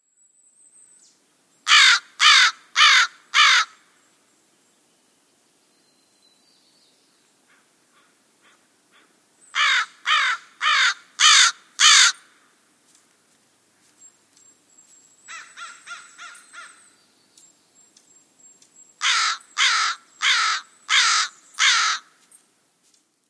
American Crow

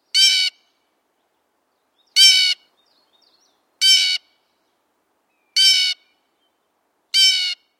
Blue Jay

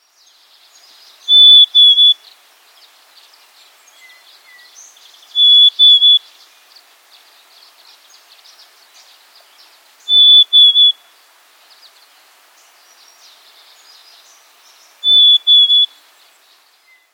Black Capped Chickadee

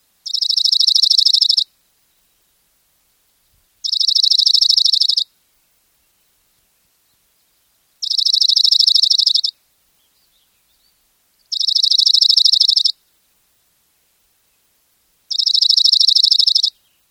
Dark Eyed Junco

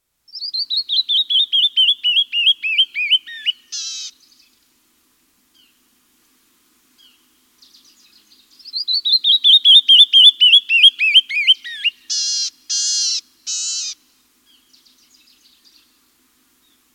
canyon wren

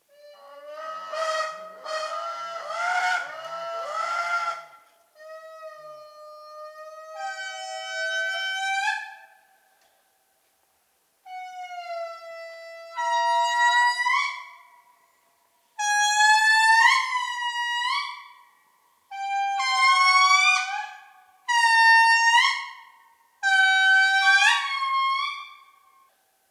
Indri

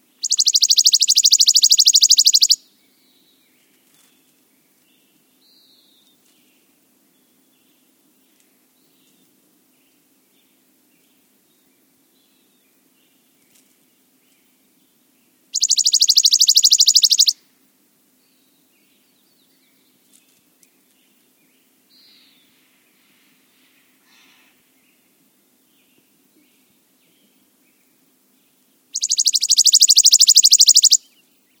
Chipping Sparrow

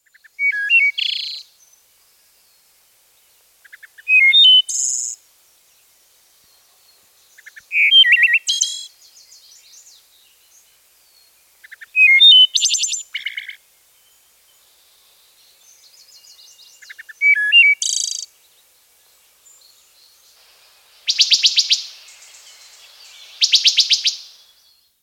wood thrush

